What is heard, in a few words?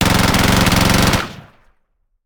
Machine Gun; Sound Ideas; Guns